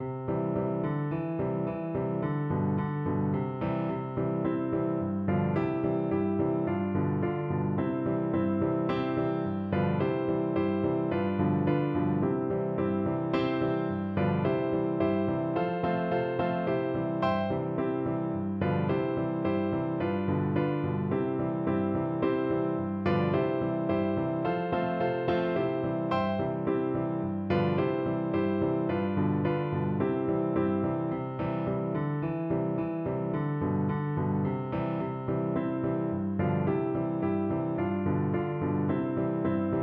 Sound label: piano